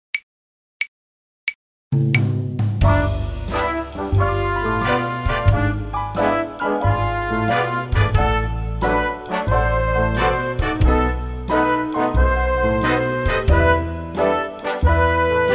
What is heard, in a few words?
blues, violin